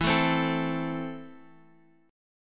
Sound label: E Suspended 4th Sharp 5th E, down, Guitar, Open F; Esus4#5 strummed